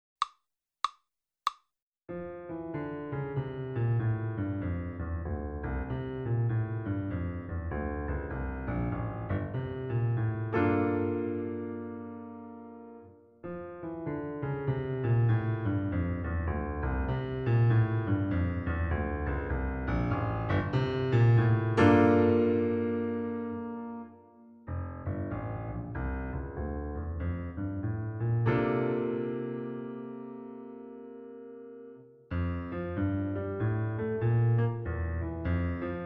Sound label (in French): accompagnement